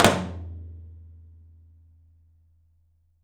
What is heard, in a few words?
open tom; 3D Sound; 3D Drums; 13 TOM